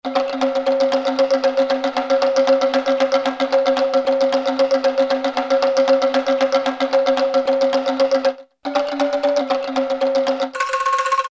Gamelan Anklung; Yamaha A4000; World - Latin Instruments; Arabic & Gamelan

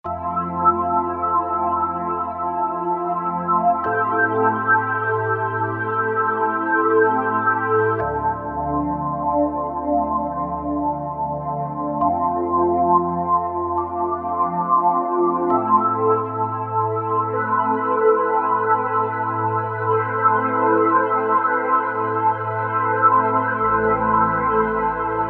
Enchanting, Korg MSC-9S Organ

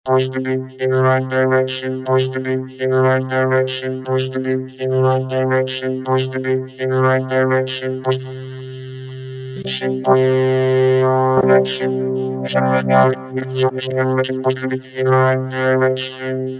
Roland V-Synth; PushTheBeat; Factory Patches

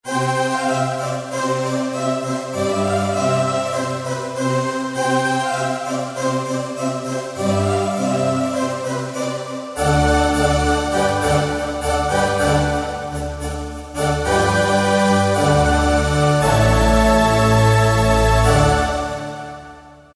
Carol, Yamaha TG500

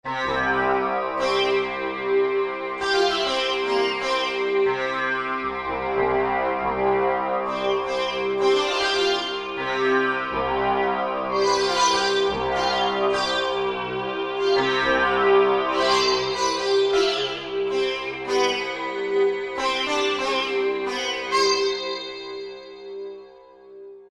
Sitarchestra; World; Roland SR-JV80-05

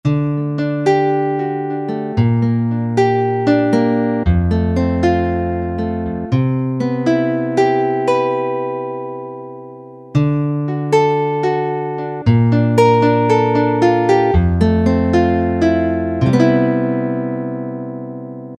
Nylon-str. Gt, Roland SC-50 Sound Canvas; Factory sounds

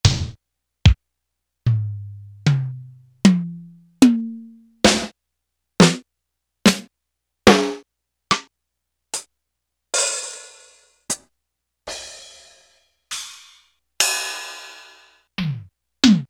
DRUMS, Yamaha PSR-300; Voices

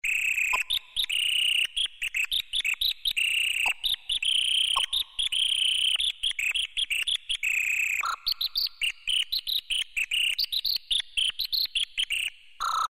Whistle, Roland MKS-50; Factory examples

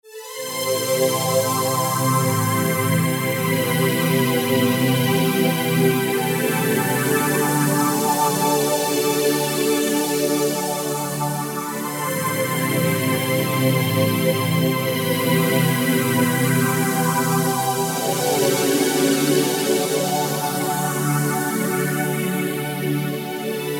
Wavy Digital Pad; synthesizer; Guess the synthesizer game

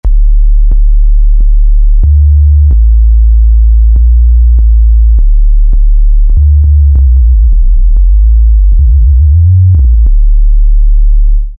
Korg microKORG Sub Bass; Factory Voices

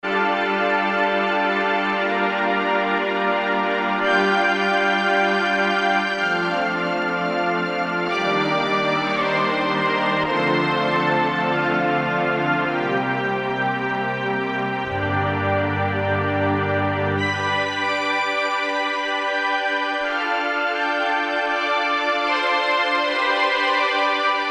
Legato, Korg DSS-1 Strings

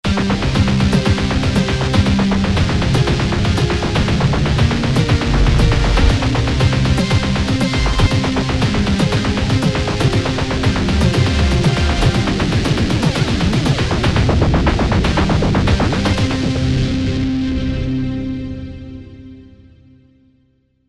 Down Spiral

